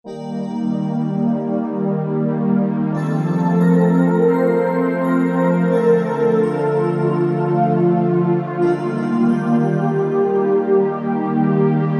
Bell Pad 880, Roland SC-880; Factory Patches

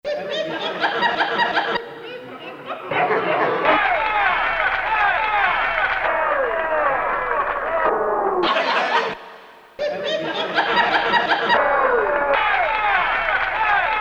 Sound Effects

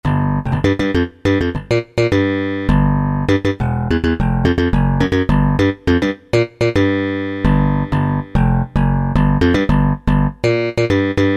Slap Bass, Roland D-110; Factory Patches

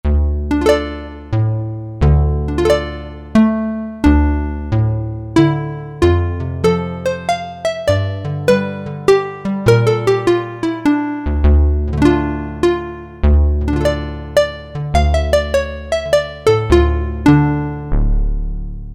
Synth Harp, Roland U-220; Factory Timbres

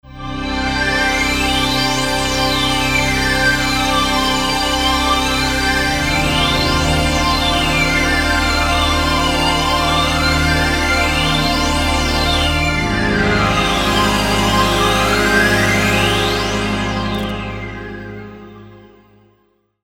Nexus Pad

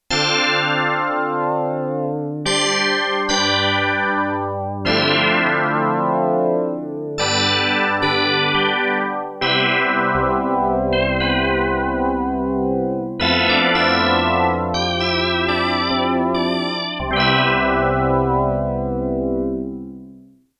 SYNTH ORGAN II, Korg DW-8000; Factory Patches

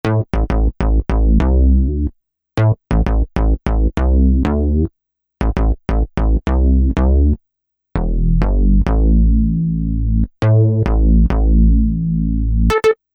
Fat Moog

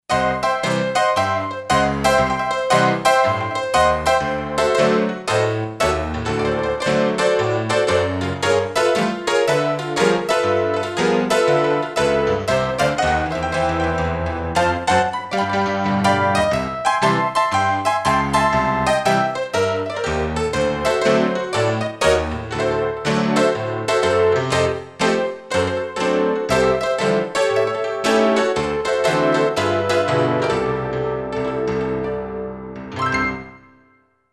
Honky-tonk, Roland SC-880; Factory Tones

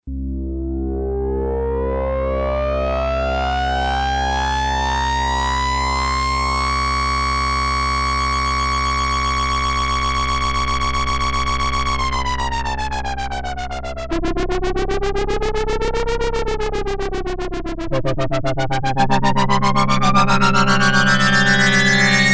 Slow Sync, Prophet-5; Sequential Circuits Prophet-5 (Ver.3); Factory Patch

